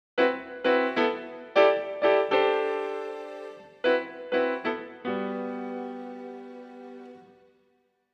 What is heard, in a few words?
Am groovy chords, keyboard